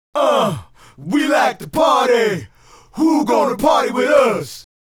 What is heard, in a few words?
Male Group, Hip-Hop and RnB Vocals